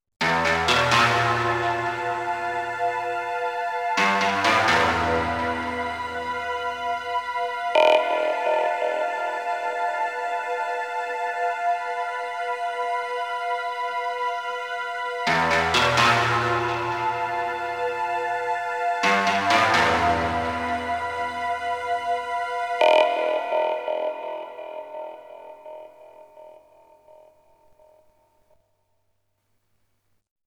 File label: Variations Melody